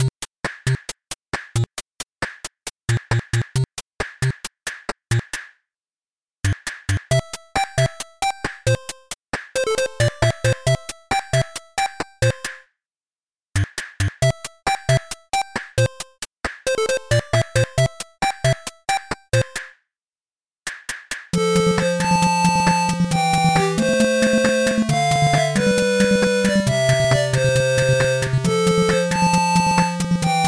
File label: Title (8-bit version); Super Mario Maker